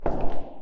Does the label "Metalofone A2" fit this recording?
No